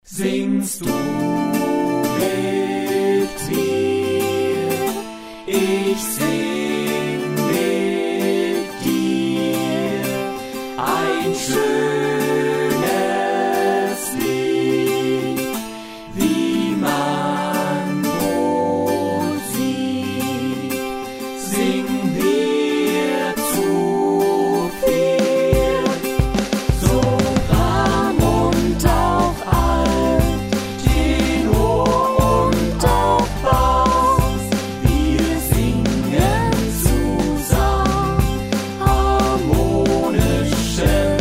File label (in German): vocal etuede ohne sopran, chor